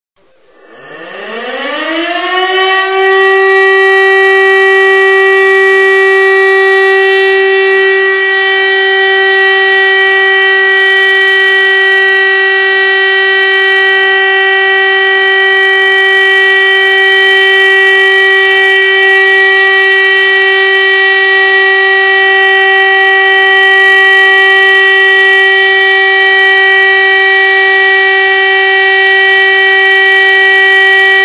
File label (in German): alarm entwarnung; Entwarnung: Ein gleichbleibender Dauerton von 1 Minute (nur nach vorausgegangenem Alarmsignal) bedeutet "Entwarnung", also Ende der G